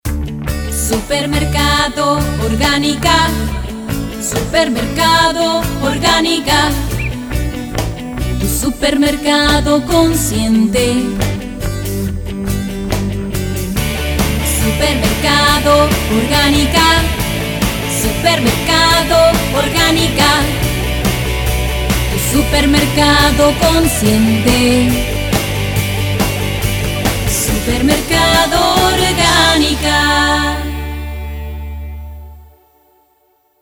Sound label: Jingle Supermercado; Organica